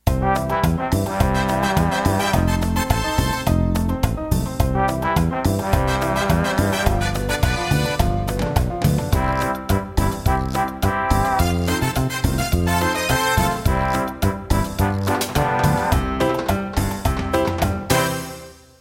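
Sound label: Cumbia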